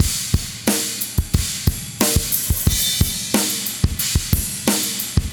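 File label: rock drums cymbal, 90bpm; Quazibeat; Rock Heaven Drums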